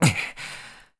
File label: Link Carry Stamina Very Low; Skyward Sword